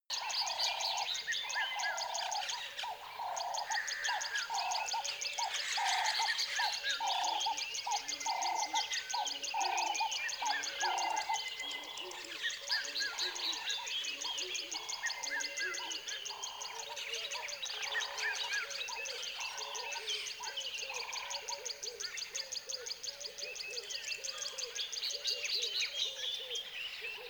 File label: tropical river wildlife